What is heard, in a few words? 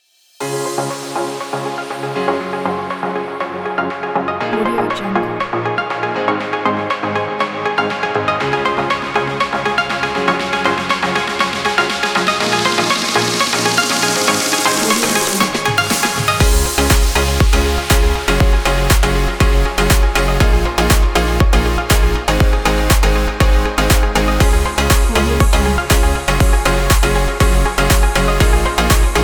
summer edm; selectedsounds